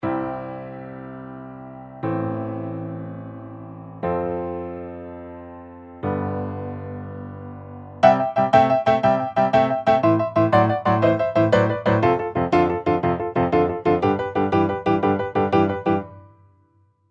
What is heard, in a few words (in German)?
Blues Klavier; Toontrack EZKeys